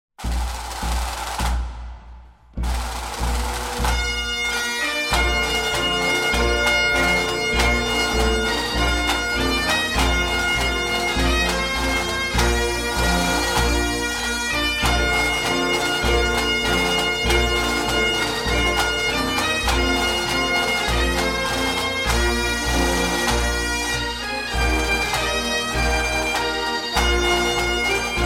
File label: Bagpipes, Marine Corps Hymn